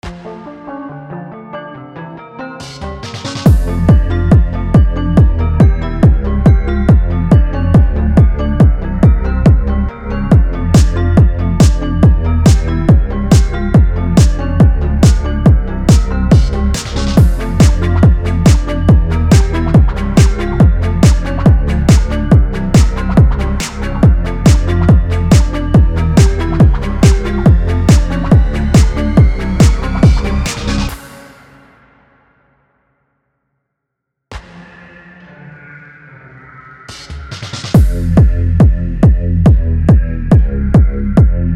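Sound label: HALLOWEEN F minor 140BPM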